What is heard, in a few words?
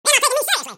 train